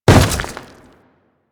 Step Dirt, Footsteps; Warframe; Grineer

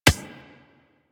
Beach Ball Floof Bounce Dirt

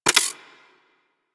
Briefcase Akimbo Pistol Reload Passive; Warframe; Weapons; Corpus

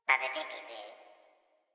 Flying Robot Voice; Warframe; Quests; Veilbreaker; Ambience; Kahl Quest

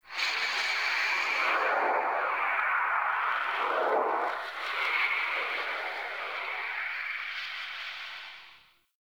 Venus Atmosphere Gust, Ambience; Warframe; Venus Open World; Venus Landscape

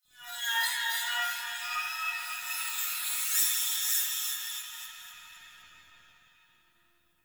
Texture Interior Small, Ambience; Sentient Lair; Warframe

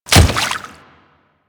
Water Step, Footsteps; Warframe; Grineer Royal Guard

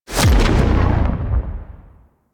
Stun Ability Burst; Warframe; Helminth Abilities

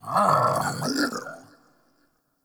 Cannonball Thrust Vocal; Powers; Bromius

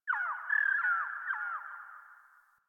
Birds Low, Ambience, Plains, Landscape; One Offs; Warframe; Eidolon Plains

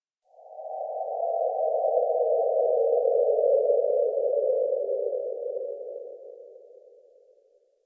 Forest Cave Howl Mid; Warframe; Grineer Forest

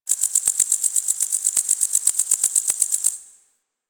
Cricket; Warframe; Quests; The New War; Ambience; Zariman

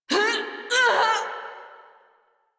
Death, Female; Railjack Crew; New Loka

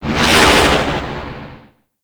Space Pod Random Thrust; Grineer; Vehicles; Warframe

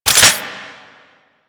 Reload; Warframe; Weapons; Gotva Prime

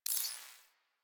UI Weapon Zoom Out; DE; Warframe; Railjack Player Ship

